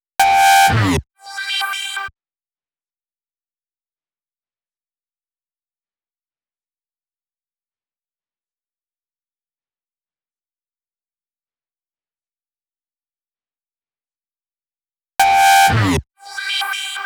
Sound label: 128 BPM FX Synth Fill; Vengeance - Essential Clubsounds Vol.5; Demo Constr Kits; Sidewalk Kit Root G